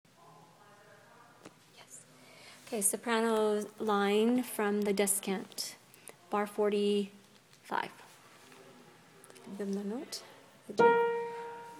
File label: Circle song Soprano line from descant